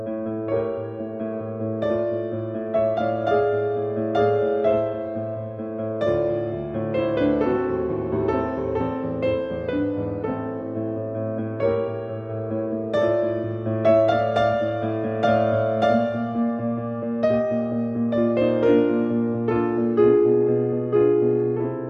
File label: music; ALTERA; DE1 demonstrations